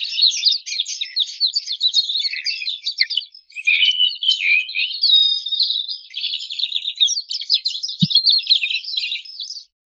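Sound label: birds chirping happy nature; daytime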